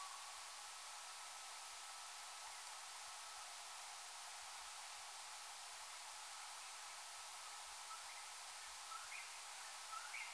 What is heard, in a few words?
WHIP